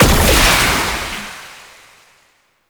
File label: Incarnon Wrist Gun Alt Impact; Warframe; Tenno; Weapons